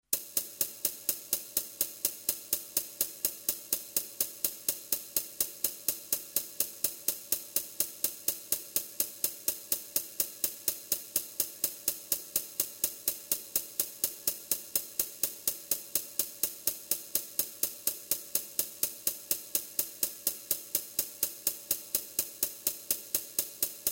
tajmer

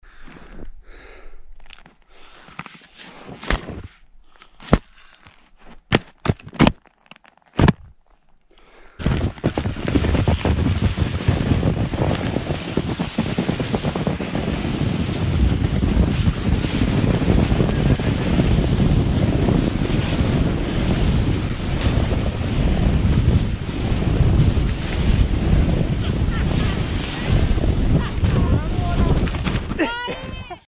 brosaet lyzhi botinki shagi spusk i ostanovka

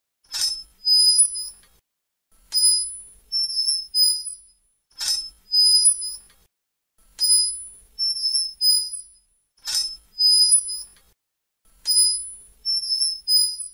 piskljavyj zvuk; Звук качелей раскачивающихся на ветру, металлических, на цепях или веревочных

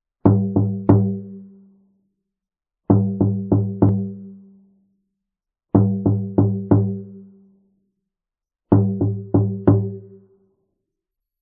meditativnyj shamanskij

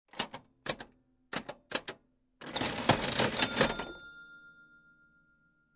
knopki starogo apparata i zvuk kassy

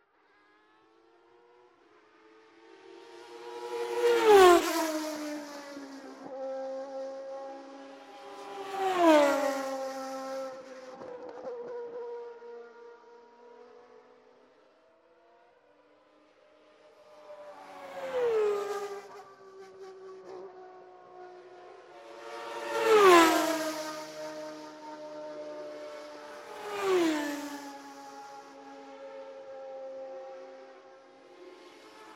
pronosjatsja odna za drugoj

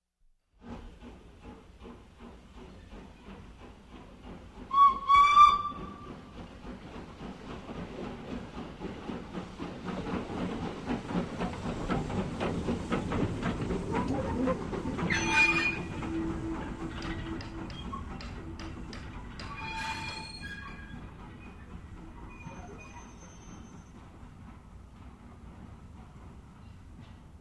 Parovoz priblizhaetsya Svistok tormoz ostanovka

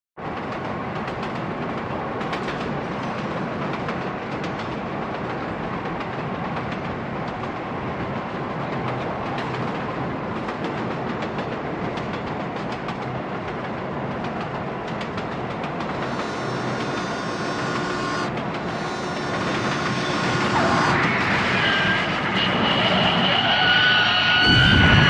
Krushenie poezda s gudkom

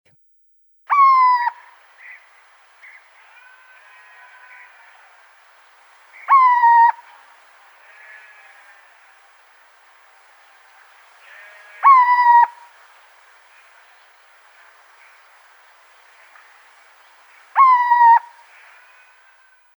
pugajushhie kriki sycha

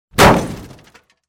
udar bamperom

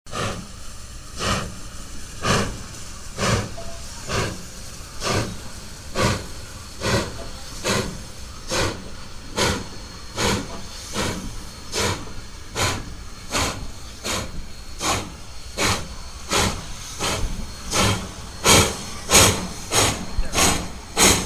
parovoz nabiraet hod i ubystrjaetsja; звук паровоза

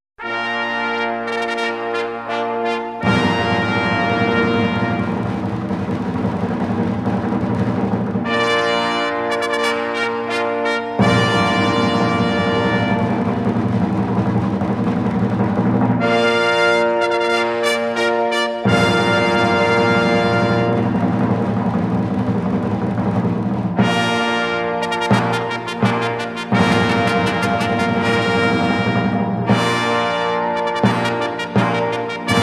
Torzhestvennye; Фанфары на начало праздника; Звук фанфар

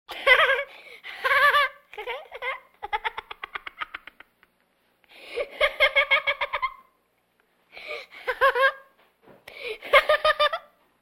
smeh malchika 8 mi let

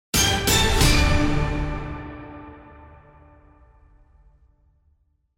otbivka

